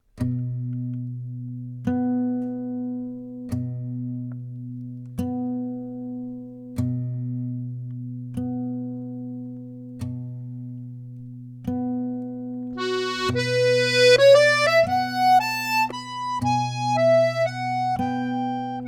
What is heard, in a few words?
Mazurka; La Généreuse; Noisette